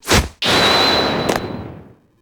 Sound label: badge; bobby